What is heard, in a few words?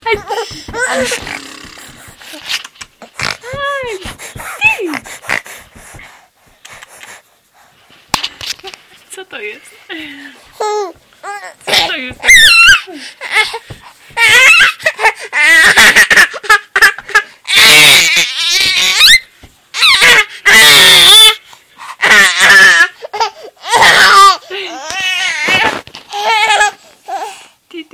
laughing